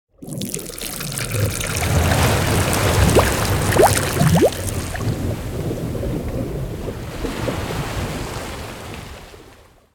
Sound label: Jaw Logo Sound; ice age scrats nutty adventure